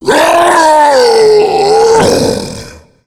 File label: tank death